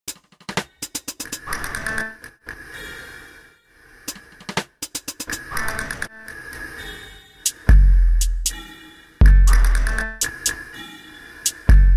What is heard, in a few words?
castanets and drums in Seville